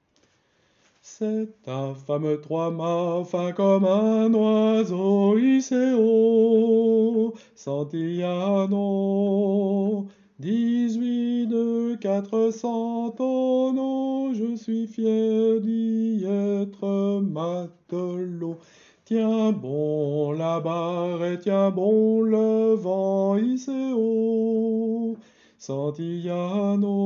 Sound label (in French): ténor couplet; couplet 1 et 4